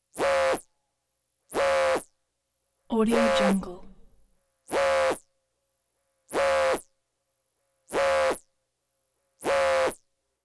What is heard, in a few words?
synth servos buzzy bursts various pitches